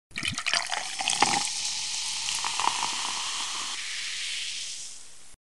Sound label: Pouring soda